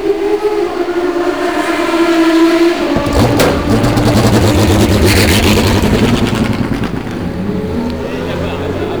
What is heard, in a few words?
viper exiting